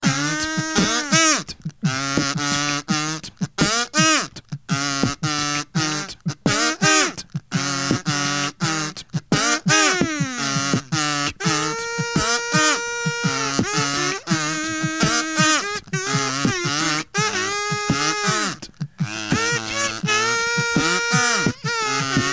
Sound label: Gaming sound